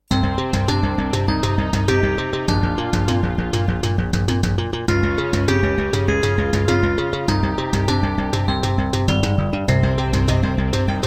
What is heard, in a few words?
Introduction, Miditemp DS-48 CleanWave32; Hocus Pocus